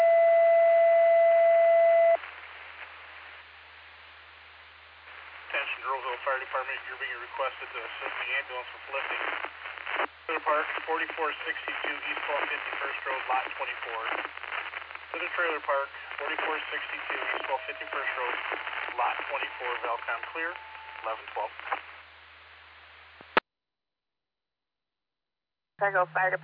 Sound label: Fire; Fire/EMS calls; Earlville; TTDAlerts